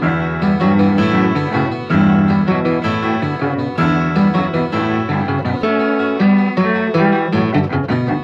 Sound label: guitar, Sad